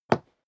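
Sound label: step leather shoes wood parquet single stair up, footsteps; schritte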